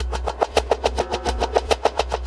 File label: Ripple Perc; Ripples; Sonic Foundry (Sony Creative Software); Processed Drumkits Zero Gravity Beats